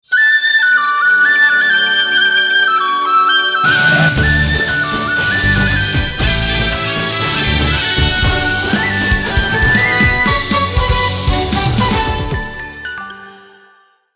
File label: ringtone energy